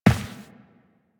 Step Default; Warframe; Tenno

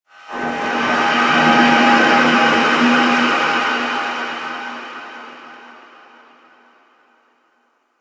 Random, Ambience Interior; Warframe; Orokin

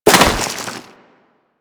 Crewman Step Dirt, Footsteps; Warframe; Corpus

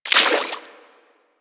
Collectible Pickup; UI; Pickups; Inf Eris; Warframe

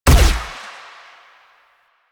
Shot Fire, Weapons; Warframe; Acceltra Prime

